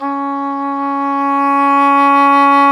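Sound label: WND English Horn, Swell; Roland LCDP04 Orchestral Winds; Eng Horn Swl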